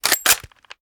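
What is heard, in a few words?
foley rechamber; weapons; gmod; viper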